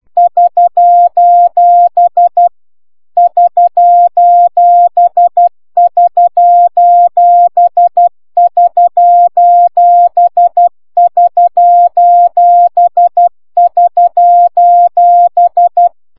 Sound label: cw sos